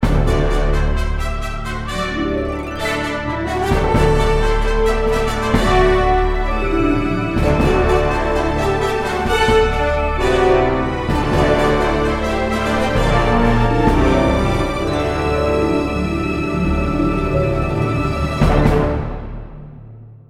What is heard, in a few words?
fanfare; cinematicsound